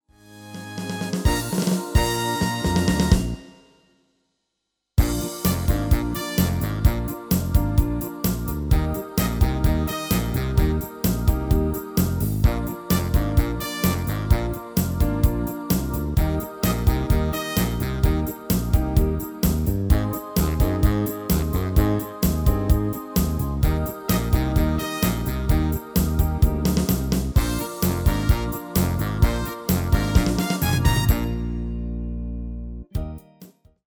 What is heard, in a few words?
MIDI